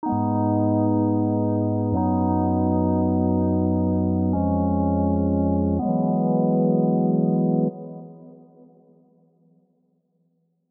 Chords Full Country

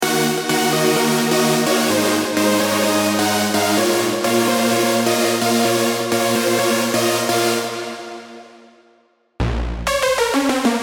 Big Room Progressive House

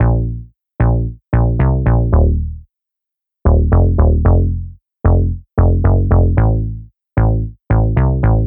RB Bass

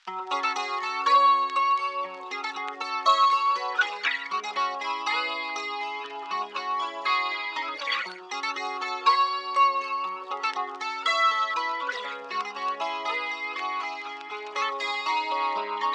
Guitar

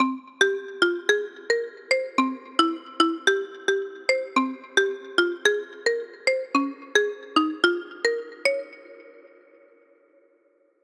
Melody Pluck Ethnic; midi wizard 2; Unison

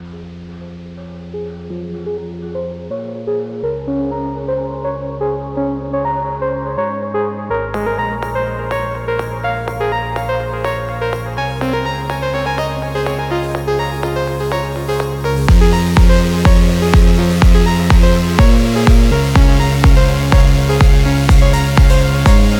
Techno; Unison

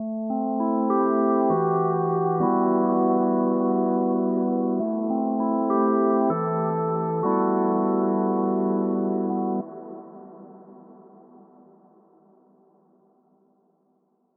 Hybrid Standard Lo Fi; midi wizard

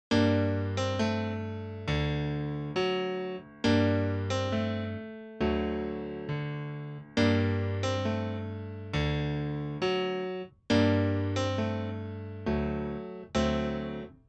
Chords Lo Fi

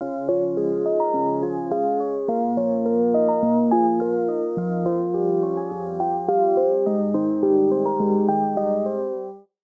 Hybrid Advanced Pop; midi wizard 2; Unison

